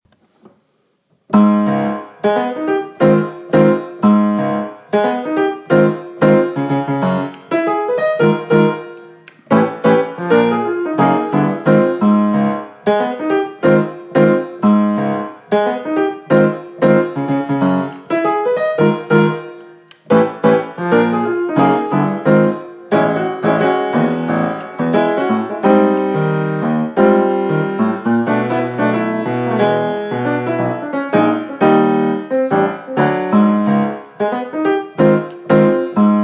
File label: bye ya